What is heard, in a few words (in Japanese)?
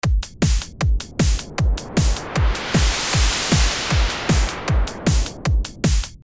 ノイズスイープres無し